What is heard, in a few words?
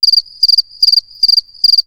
atmo; Techno Trance Essentials; FX & ATMO